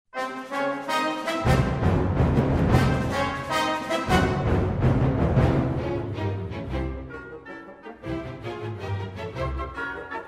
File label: Piano Con